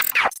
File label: sub glitch